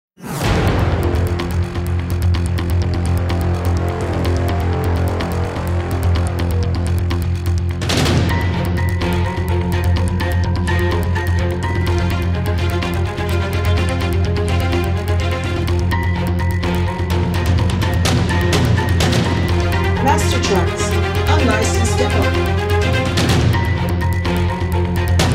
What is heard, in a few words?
Suspenseful Action 126bpm; MASTERTRACKS